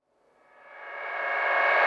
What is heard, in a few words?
HOUSE FX 125